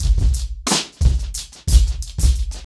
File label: 90BPM, acoustic drums; The Roots Drumloops